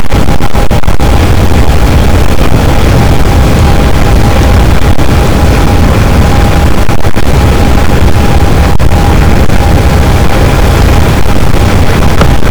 cachalot, boat; Physeter Macrocephalus

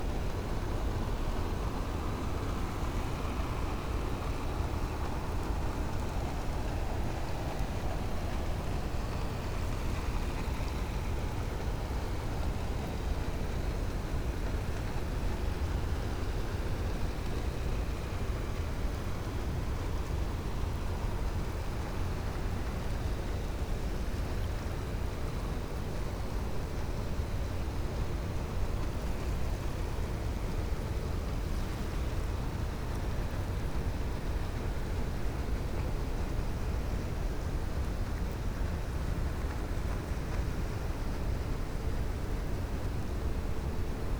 cachalot negatif, Physeter Macrocephalus

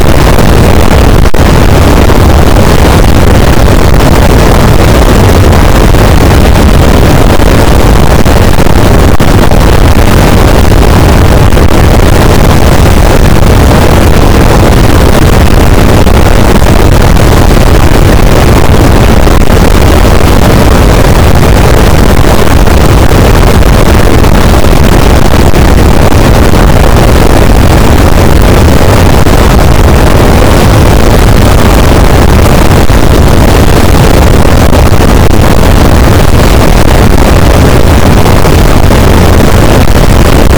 cachalot positif, boat; Physeter Macrocephalus

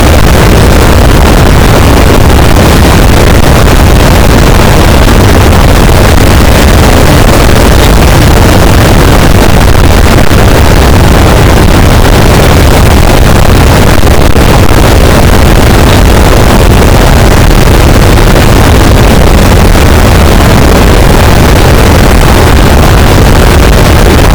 cachalot negatif, boat, Physeter Macrocephalus

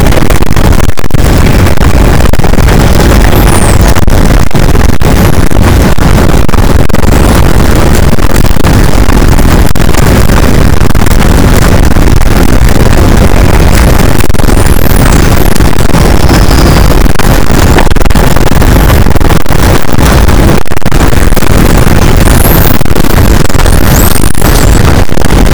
cachalot negatif, boat, Physeter Macrocephalus

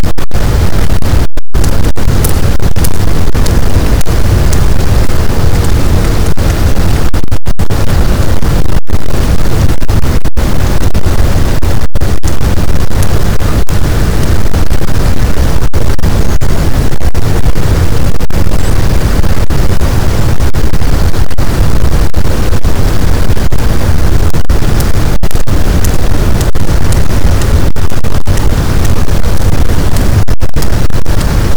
cachalot positif, boat, Physeter Macrocephalus

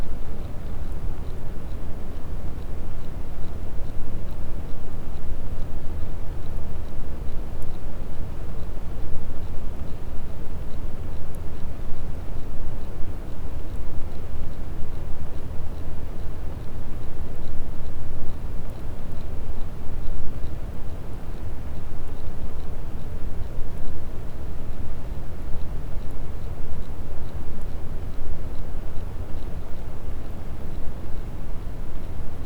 cachalot positif, Physeter Macrocephalus

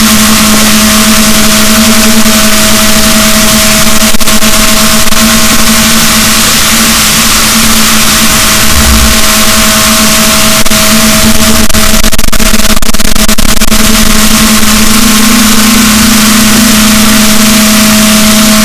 rorquals, boat, Balaenoptera Physalus; SNR 6dB

